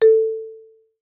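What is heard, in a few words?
Marimba rubber fortissimo A2; MIS Pitches - 2014; Percussion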